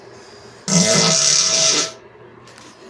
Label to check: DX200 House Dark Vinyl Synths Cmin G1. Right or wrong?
Wrong